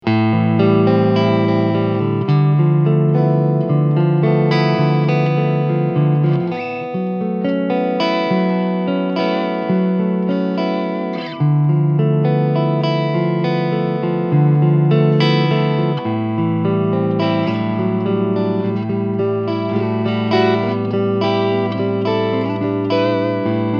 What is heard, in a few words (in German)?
Laney Cub Super8 Cleansound Volume Tone 12 Uhr